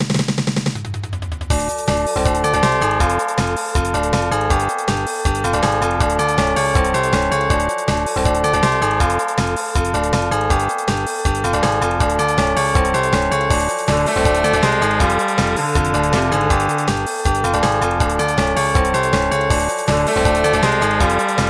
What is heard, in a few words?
bell falling; Antonblast MIDI Collection